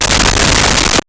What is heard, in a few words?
Radio Hit Digital Disturbance; Transitional Elements